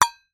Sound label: tin metal small hit knife; foley; zapsplat